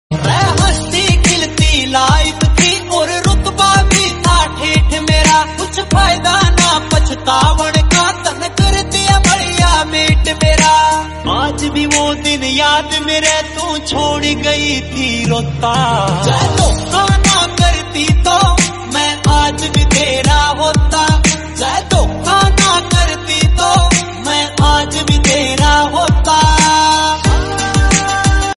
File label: hindi breakup song